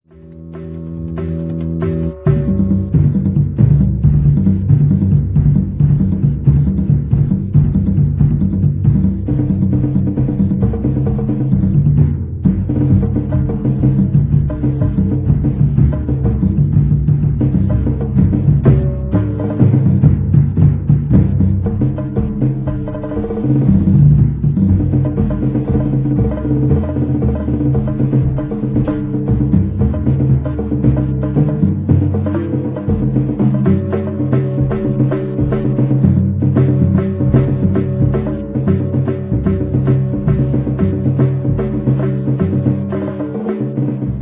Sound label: drum solo